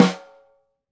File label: SNR, Synclavier Percussion 2 - World & Orchestral